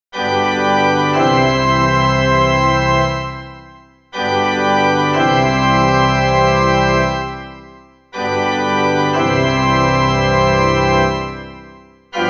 tenorklausel; dommer 1865

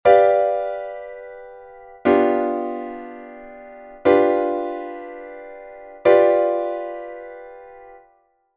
septimenakkord; riemann 1882

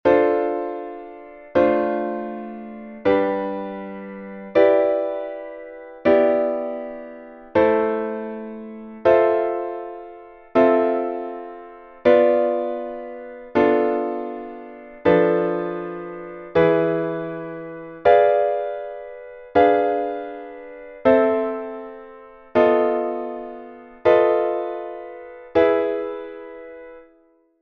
terzquartakkord; Terzquart-Accord, Terzquartsext-Accord; mendel reissmann

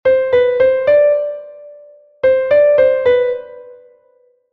groppo; dommer 1865

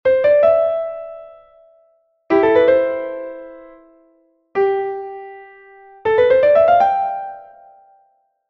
schleifer

